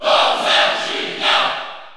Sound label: Cheer French; Bowser Jr.; SSBU